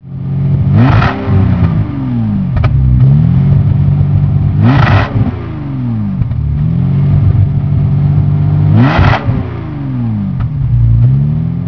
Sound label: etype v12 trot, classic car